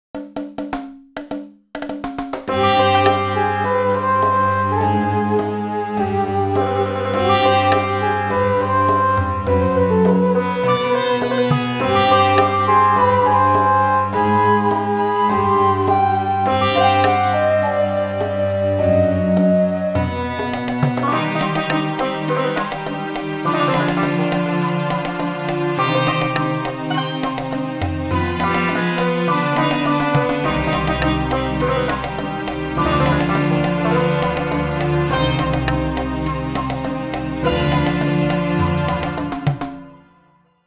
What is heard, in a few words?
Ethnic India